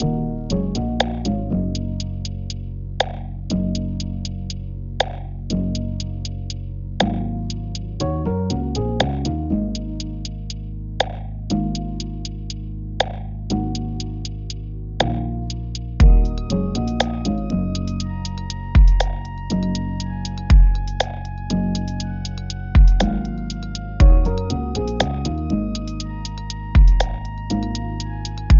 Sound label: Lo-Fi